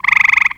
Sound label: TREE FROG; Greytsounds VOL-1